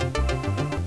Short Down; HelloWorld8Bit

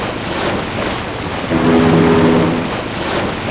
steam shp

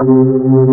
B3 Dist Fast C5; SoundFont - The Developer's Choice - Volume 1; b3fast

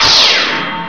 game over; Hazardous 1.2

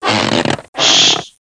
bulge

